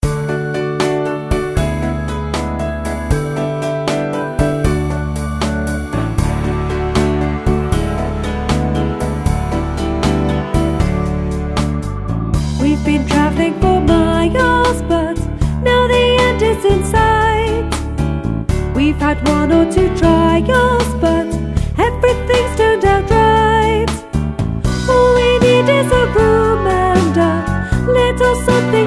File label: Ring Ting Ting